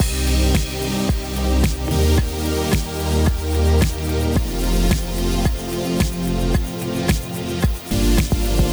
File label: Supersaw, Loops